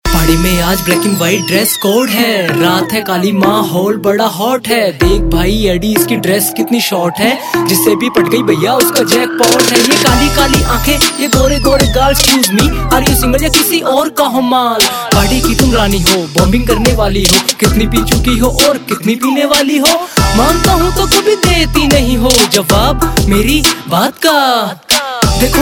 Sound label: Rap Ringtone, Punjabi Pop